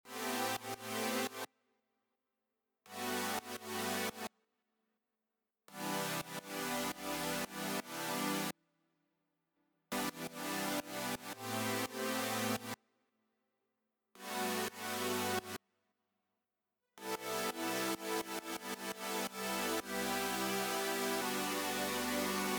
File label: chord